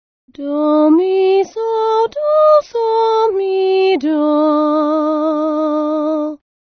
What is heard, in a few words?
Chest and Head Voices